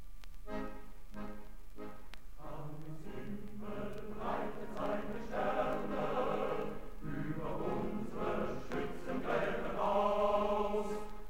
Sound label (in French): Chants Revolutionnaires Allemands; Die Thaelmannkolonne